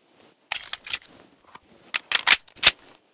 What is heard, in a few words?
pb reload empty, weapons